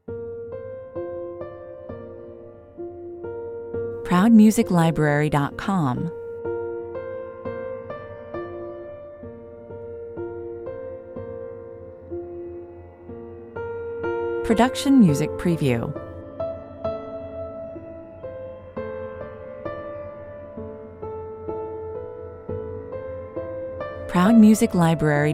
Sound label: solo piano